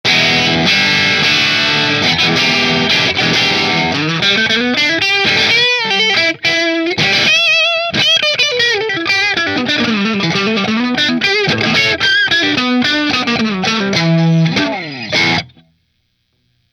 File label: Mcnaught black back middle marshall, guitar; McNaught Custom Guitars Vintage Single Cut Black Back